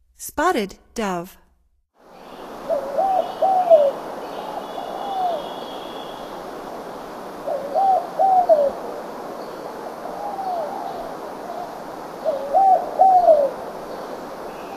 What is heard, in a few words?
Spotted Dove; North America; Stokes